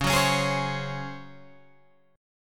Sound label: Db Major 7th Suspended 4th Db down Guitar Open G